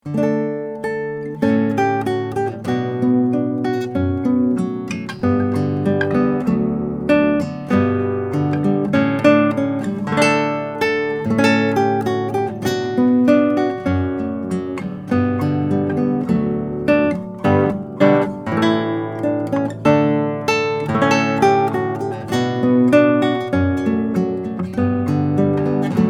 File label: Canon; Schoeps CMMT; RAMIREZ CLASSICAL HARP GUITAR